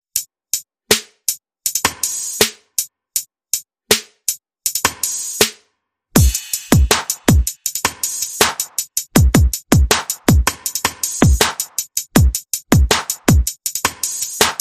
Sunday Mimosas, Perc and FX Only

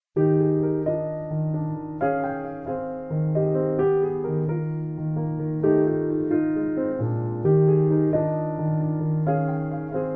Solo Piano

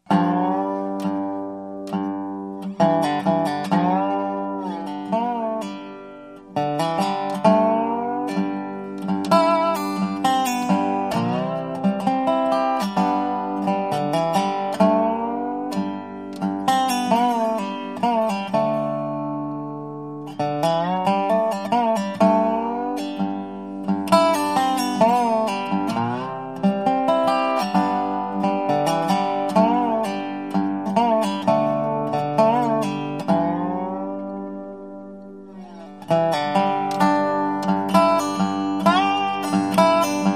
Solo Dobro Ballad

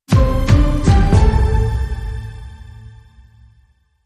Logo Only Underscore

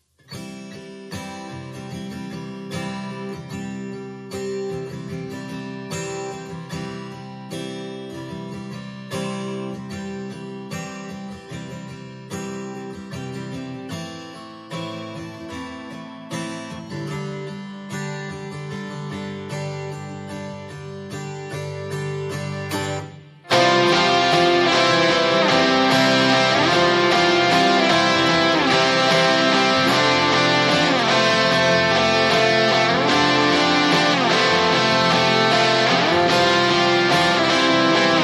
Guitars

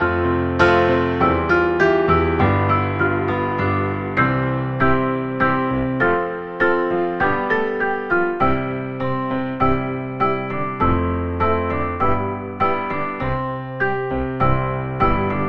Piano Solo

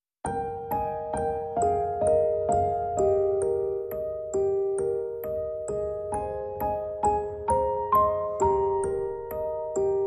Piano & Music Box

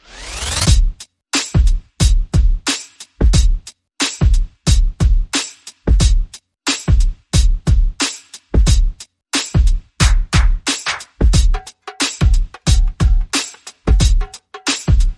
Something Funky, Perc and FX Only

